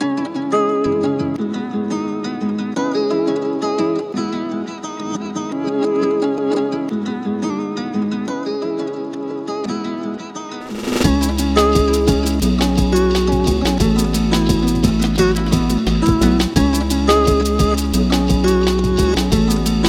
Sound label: break ballad